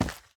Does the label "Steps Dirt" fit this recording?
Yes